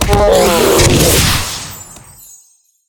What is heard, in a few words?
Grunt Die; wildworlds